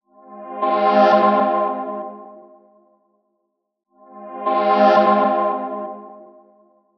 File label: Cosmic Pad dry